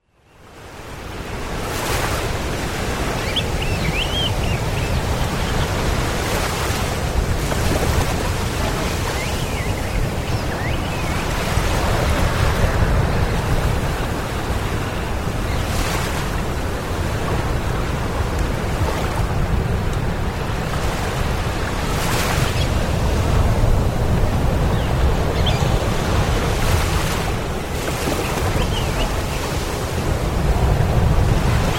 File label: big surf